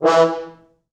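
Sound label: 4 French Horns, Horns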